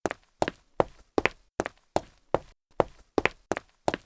dance

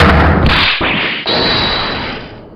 dance; souseki

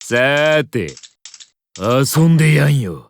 knife spin end; terumi

